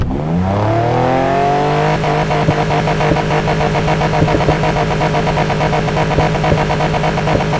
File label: rev; vehicles; fordrafa; nfs heat ganimator pack